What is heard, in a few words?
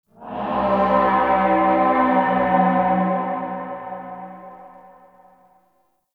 Town Distant Horn Alarm; Ambience; Gameplay; Duviri